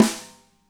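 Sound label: snare left; Spectrasonics; Burning Grooves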